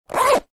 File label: zipper